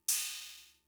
Cymbal, Fricke MFB-522, Drum Machines

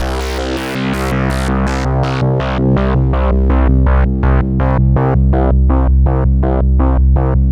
PULSE MOOG BAS, MEMORYMOOG; Zero-G Ambient 1

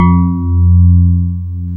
PIA DX E-PIANOS

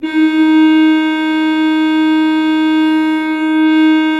D3, Cathedral Organ; ILIO; Propeller Islands

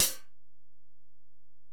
HiHat, Alesis DM-PRO

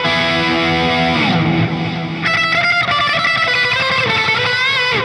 95bpm, 80s heat samples; musicradar

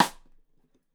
Side Stick Stereo; Deeply Sampled Drums

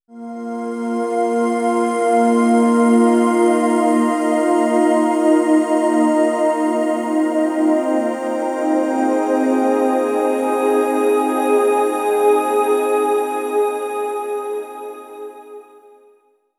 Hi Complex, Complex Wave

